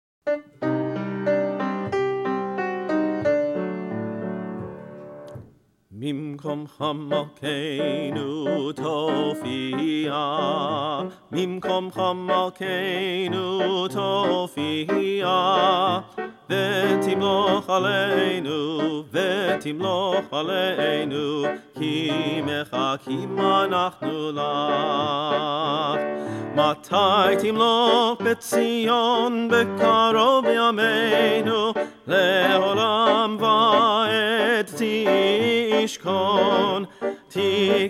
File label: Mimkomcha, Cantor